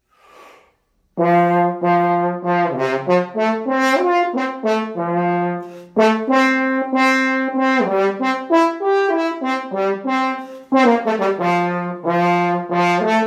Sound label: horn